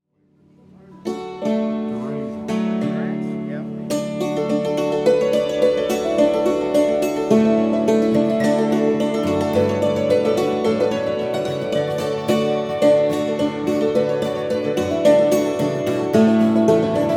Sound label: Durang's hornpipe